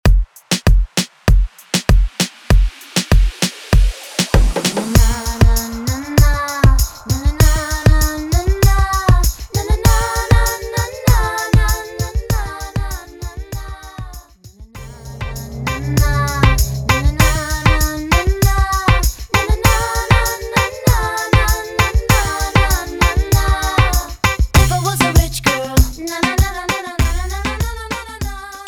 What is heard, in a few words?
clean 98 bpm